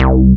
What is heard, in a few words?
DD BAS, Disco House; Best Service Dance Mega Disco House